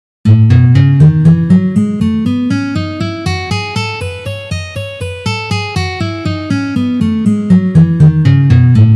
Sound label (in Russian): Gamma Mi mazhor Tri noty pozitsiya